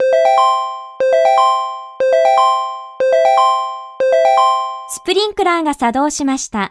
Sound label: dry alarm